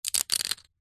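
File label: leisure poker chips stack quickly in hand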